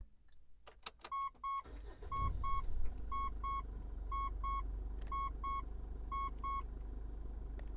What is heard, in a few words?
Car Starting